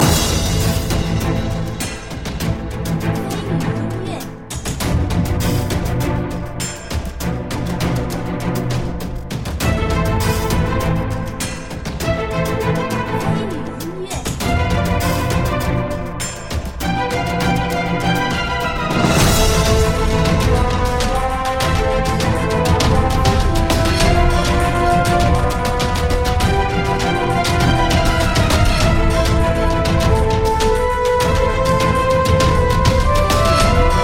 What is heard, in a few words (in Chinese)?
城市; 星际卫队